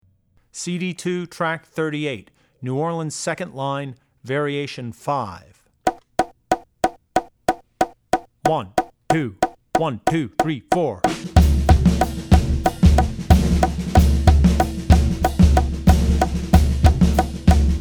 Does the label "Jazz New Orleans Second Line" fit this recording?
Yes